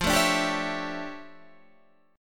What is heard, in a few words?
D Minor Major 11th, F